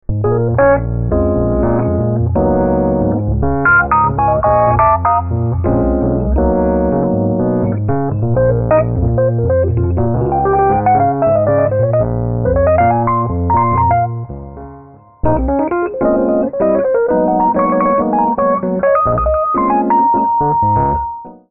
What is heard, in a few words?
Fender Twin Reverb, fender rhodes